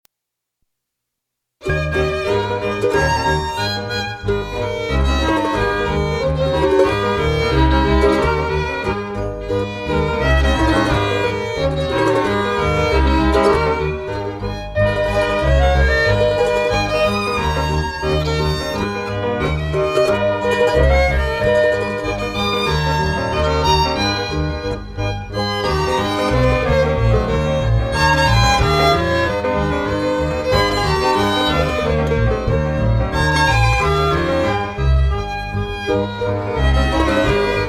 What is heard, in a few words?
tango presto argentino